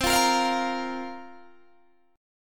C 7th Suspended 4th, Guitar, DADGAD

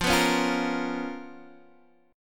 Db 13th F, guitar

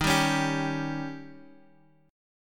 D sharp 7th D sharp; strummed; JGuitar

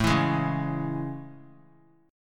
A Major 7th A; AM7; strummed; JGuitar

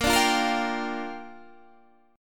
G Minor 7th, Bb

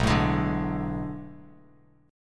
B Minor 13th B down Bass Standard; Bm13 strummed; JGuitar

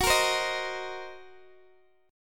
Fsharp 6th Flat 5th down Guitar Open D

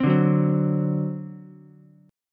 C Suspended 2nd Flat 5th Ukulele, Csus2b5 chord; Csus2b5 strummed

